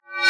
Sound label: Physics Object Weight Impact